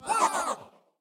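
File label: jump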